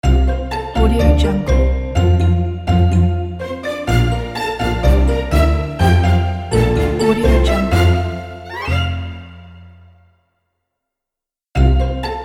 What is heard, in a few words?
cartoon kids intro logo